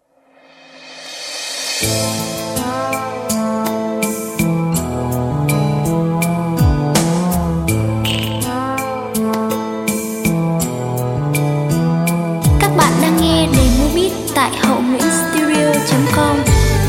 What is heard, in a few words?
beat, playback